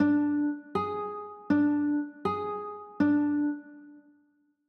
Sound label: b flat 9, chords